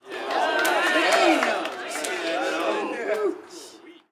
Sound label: street ball crowd cheer low; SFX Kit; Crowds